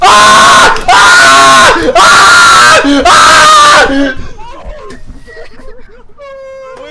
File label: section fail; Azer+ 2023